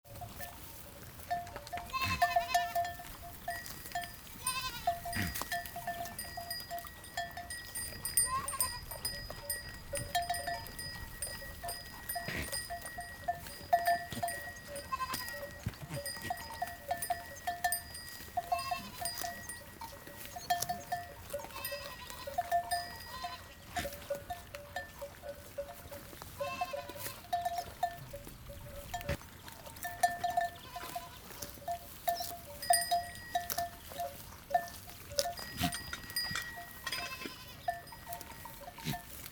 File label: goats grazing